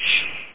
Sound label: Drone Fuel